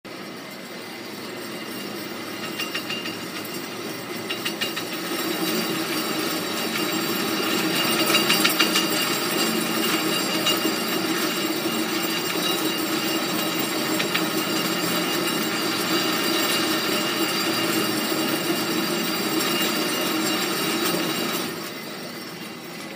SF cable car

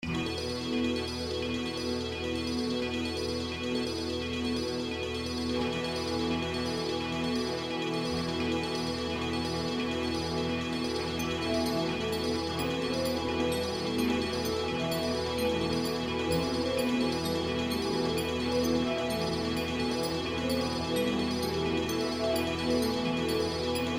choir strings; magnus choir; syntheway strings vst plug

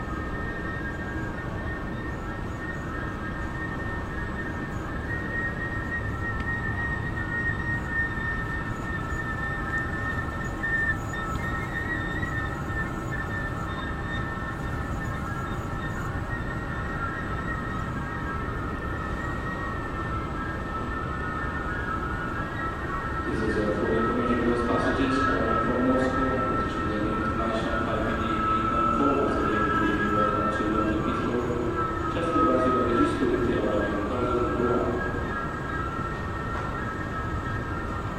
heathrow airport terminal 1 baggage reclaim

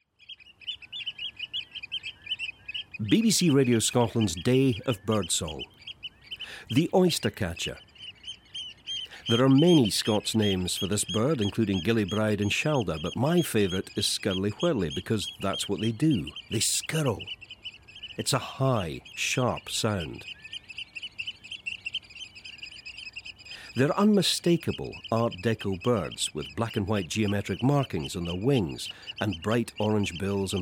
oystercatcher; Radio Scotland; day of birdsong

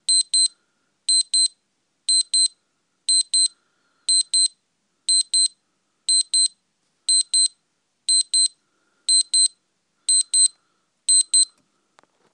horrible noise alarm

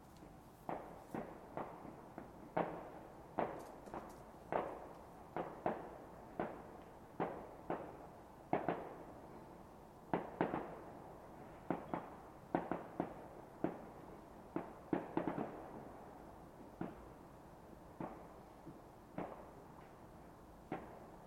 another short burst of london fireworks

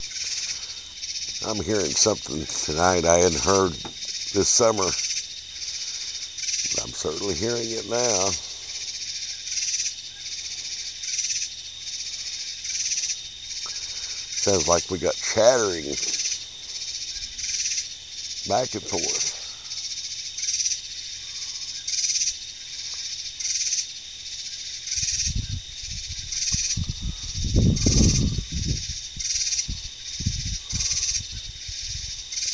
night sounds

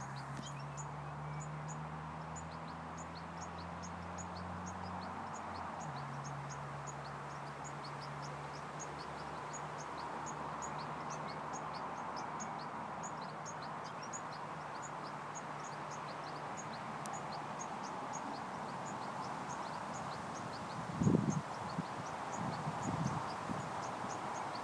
birds

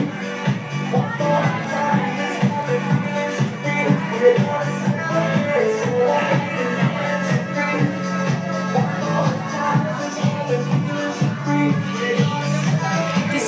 crappy music

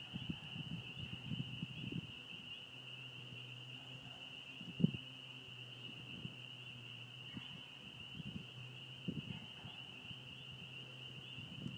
spring peepers

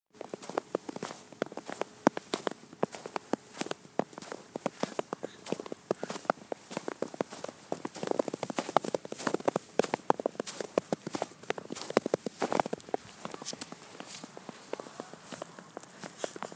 walking in snow

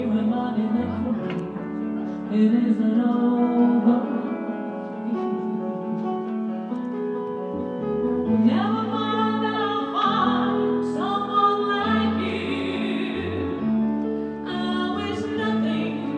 pub singer style

